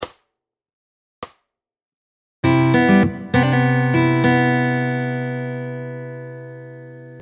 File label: Fingerpicking Lick Variation; Fingerpicking Fun